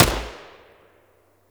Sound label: Shot; Warframe; Eidolon; EidolonJetpackMarine